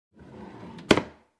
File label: Closing Drawer